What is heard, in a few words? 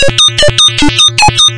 150 piercing bleeper, drum loop; 141 to 160 bpm